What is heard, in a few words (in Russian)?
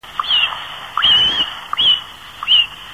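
chernyy grif golos, cinereous vulture voice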